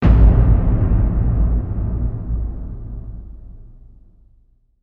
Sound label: Hit Lo T-Rex; Brand X Music; Brand X Music Catalogue - Editor's Essentials